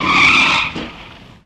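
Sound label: Car Skid Around Corner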